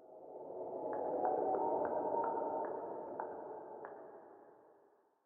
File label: ambient wind